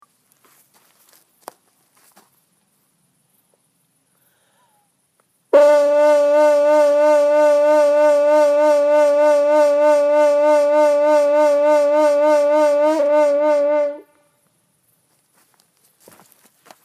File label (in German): Vibrato mit den Bauchmuskeln, trompe